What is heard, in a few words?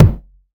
rich mid range kick one shot e key; kick drum samples